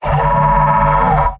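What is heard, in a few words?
battlecry, male; pyro; tf2; garrysmod; mvm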